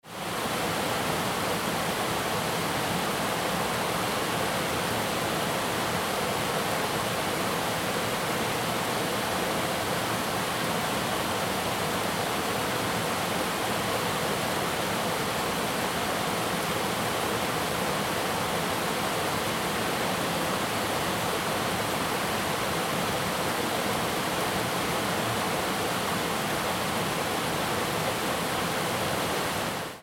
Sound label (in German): Wasserfall; Radreise Skandinavien 08 2016